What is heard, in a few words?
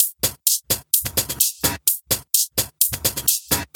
Minimal Loops